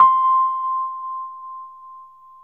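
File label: Rhodes, C5, left; 3D Sound; 3D Keys VOL-1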